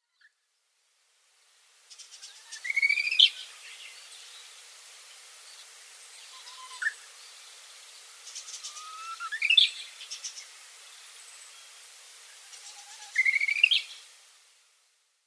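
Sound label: Korean Bush Warbler; chosenuguisu; koe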